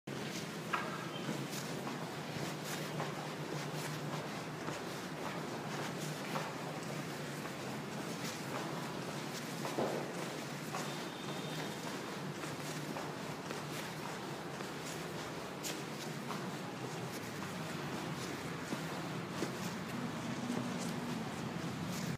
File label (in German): Unter dem Eingangstor der Zitadelle hindurch